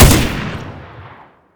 m16a4; garrysmod

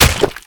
eat gib; garrysmod

